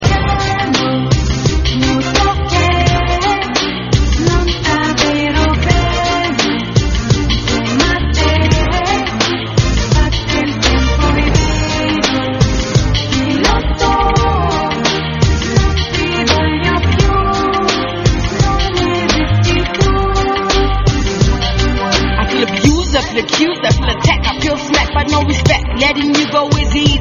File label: Piano Piano; Highland